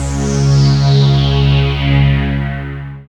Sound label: SYN DANCE; Sound & Vision - Gigapack II